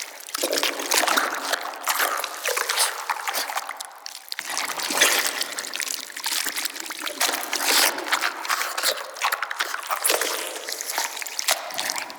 Inside Amb Loop; Ambience; Bromius Inside

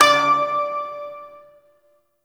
Shawzin Oct Three D; Warframe

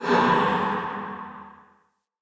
Ghost Soldier Pain, Vocals; Enemy; Wandering Ghost Soldier

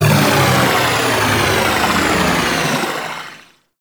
Stomp Wave; Enemies; Weapons; Warframe; Tau; Sentient Dactolyst

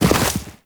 walk deep snow, footsteps; Warframe; Operator

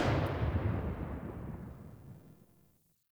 Turret Loop End; weapons; Lasrian APC; Warframe

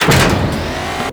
Cloning Tube Start; Warframe; Ambience; Grineer Ocean; Ocean Sabotage DNA

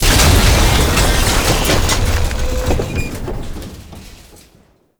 Academy Door Destroy; Tauron; Warframe; Betrayer

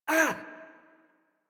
Generic Short Pain Operator Voice en; Warframe; Operator Mission

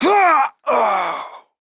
Death, Corpus Male; Warframe; Modular Corpus; Battle Barks; en

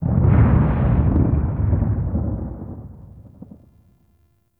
Sentient Vessel Step Delay; Warframe; Tau; Movement

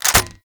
Sniper Reload; Kahl

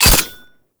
Wrist Gun Low Clip Mech; Weapons; Warframe; Tenno

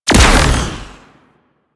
Space Fighter Fire; Space Fighter Gox Agent

